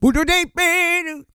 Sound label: SING, Vocal Planet R&B & Dance; ILIO